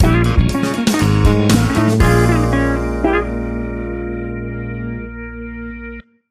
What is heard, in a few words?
Funk Band Rhythms Stinger